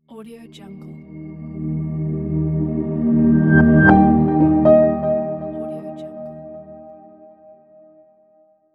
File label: ambient inspirational logo; musicalsmile